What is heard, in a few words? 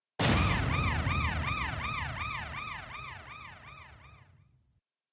Character Approaching; Five Nights at Sonic's Maniac Mania